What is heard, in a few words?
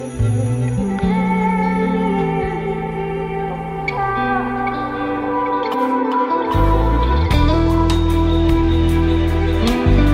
ringtone bollywood ringtones